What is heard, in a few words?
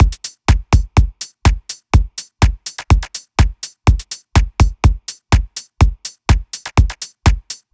House Drum Loop 124 BPM; 99Sounds x Monosounds; Dance